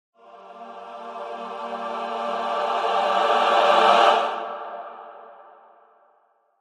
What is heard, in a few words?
Short Choir Only Swell Sting